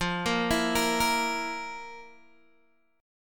F Major 7th Suspended 4th, Guitar, DADGAD; 15,13,14,15,13,x; down; chord shape

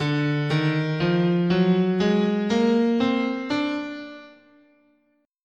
D Altered, guitar, scale

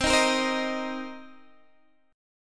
C Minor 7th C Guitar Open D

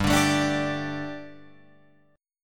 G Suspended 2nd G, down, Guitar, Open F; Gsus2 strummed; JGuitar

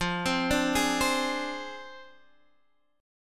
F Minor 6th F down Guitar Standard; Fm6; arpeggiated; JGuitar

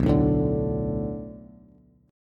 B Minor 7th B Bass, chord; Bm7; strummed; JGuitar

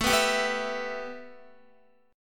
A 7th Suspended 2nd Guitar; A7sus2; strummed

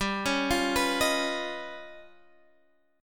Db 9th Flat 5th G

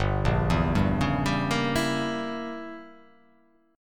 G Diminished 7th; down

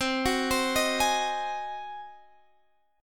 Ab 7th C

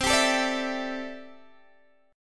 C 6th Add 9th, C, down, Guitar, Open G

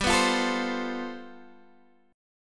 G 11th, Guitar, Open G

